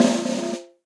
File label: Snare Press; Snare Articulation; Drum Hits; Cop Style; Session Drums Studio; Standard Hits